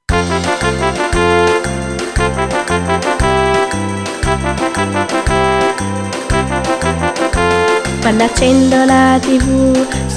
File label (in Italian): tarantella; Bimbofestival 2015